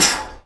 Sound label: Metal Tamb, Real Drumkit